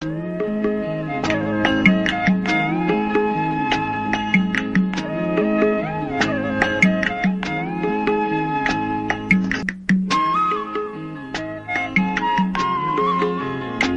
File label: flute bgm